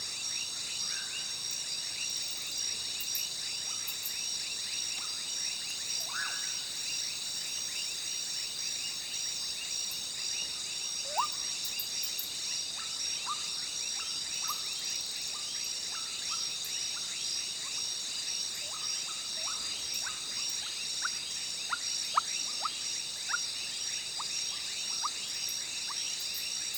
Savannah shrubs night twilight concerto mysterious creature; UNDISCOVERED